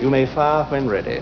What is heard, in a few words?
pain; stormtrooper